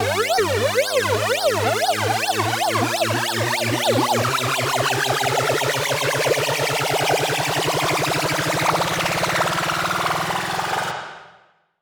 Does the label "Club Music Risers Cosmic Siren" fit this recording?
Yes